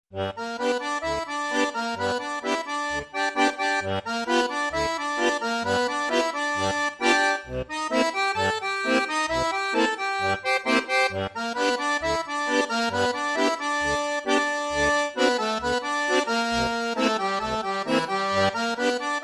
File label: squezee box boogie, cajun